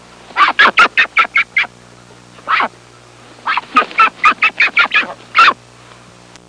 Scared duck sound

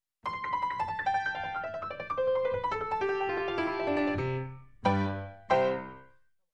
Doubled Piano Scale Down Ending

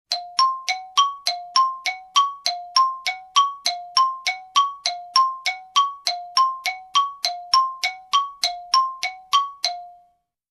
Xylophone suspicious melody

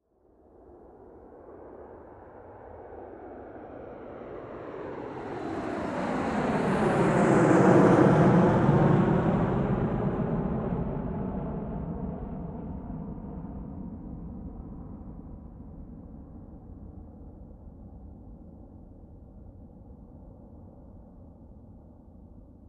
Aircraft Flying Over Whirring

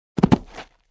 Boxes being dropped bang sound

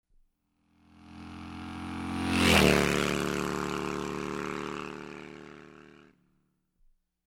Speedy Motorcycle Passing by

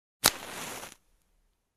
Match lighting up

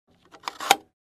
Overhead Bin Closed

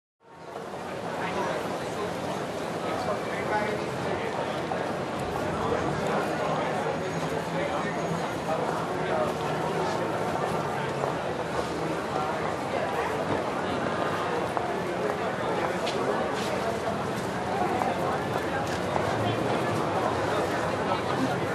Crowd in a busy street

